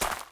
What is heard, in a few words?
minion footstep dirt; smite; npc; roman archer